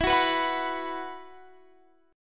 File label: E Suspended 2nd E down Guitar Drop D